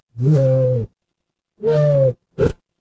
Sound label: animal cat meow